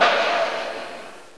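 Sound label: Emergency Brake, Train; OpenBVE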